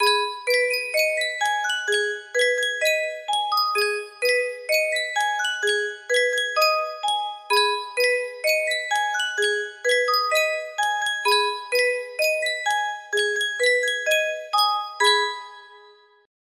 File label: Yunsheng music box, melody